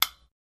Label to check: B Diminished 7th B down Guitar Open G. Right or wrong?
Wrong